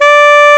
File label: D3 SMO SAX